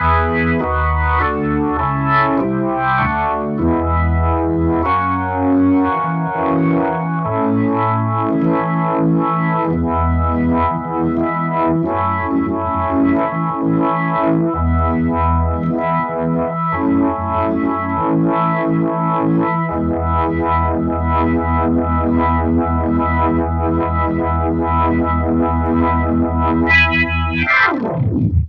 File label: rotovibe